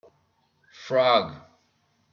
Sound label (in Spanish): rana